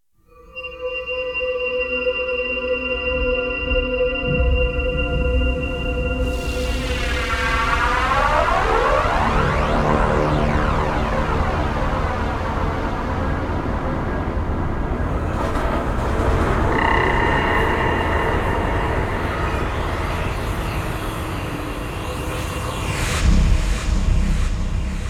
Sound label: mixes